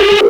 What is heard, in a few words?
Synth Sound; Vengeance Samples; VEE Synth Shots